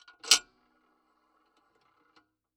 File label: PayPhone